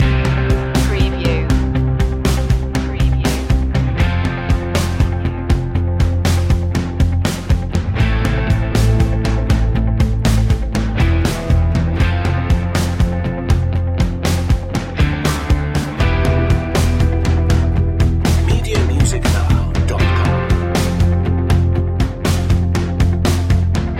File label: anthemic rise loop